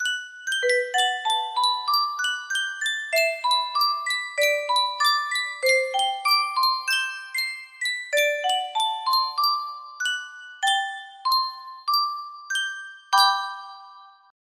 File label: sankyo music box